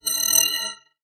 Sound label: sci fi ish